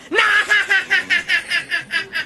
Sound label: laugh